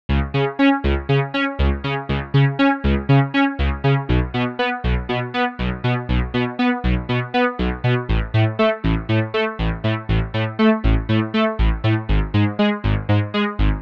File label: fm sequence; the analog sound waves